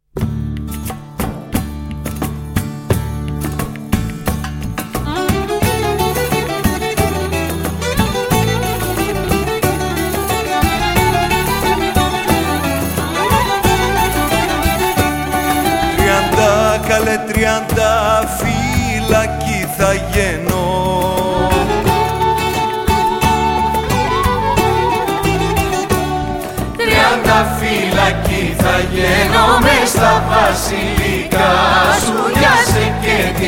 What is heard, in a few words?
Duet